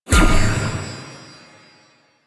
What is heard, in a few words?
Wave Ability Cast; Enemies; Abilities; Warframe; Duviri; Dax Knight